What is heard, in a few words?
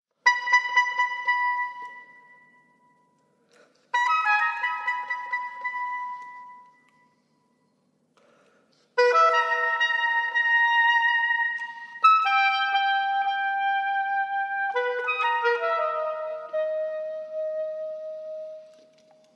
oboe solo